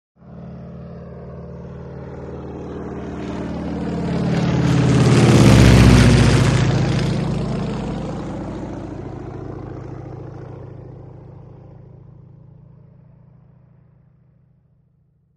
Very Low Engine Growl With By, Audible Clattering With Away. Medium To Distant Perspective. Prop Plane.; broussard